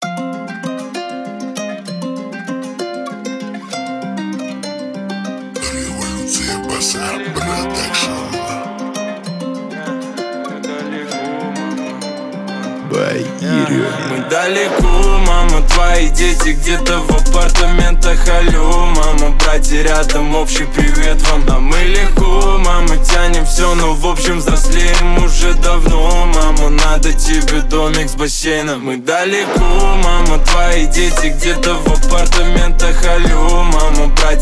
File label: 23-26Hz Low Bass